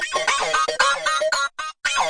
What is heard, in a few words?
panning echo hardsynth, synth and electronic loops